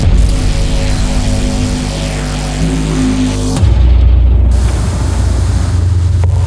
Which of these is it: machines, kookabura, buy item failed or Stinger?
machines